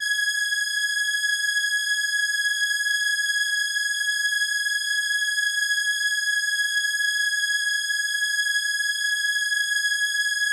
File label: violin G#6, ARP Solina